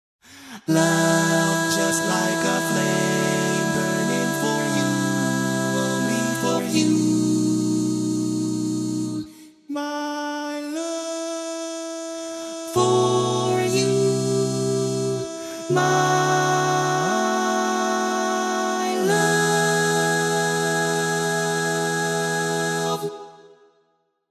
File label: Tenor, barbershop tags